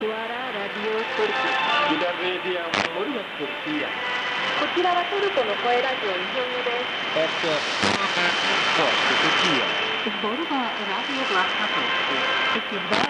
17530kHz; TRT

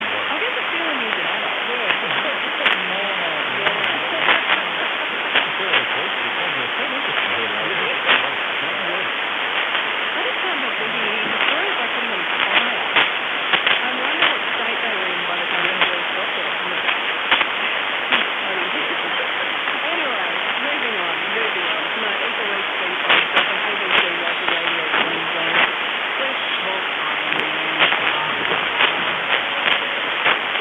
630KHz; ABC

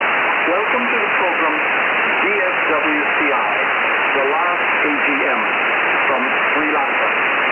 9715 KHz; DSWCI

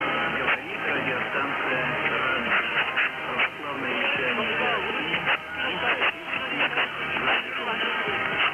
738KHz; Radio Radonezh

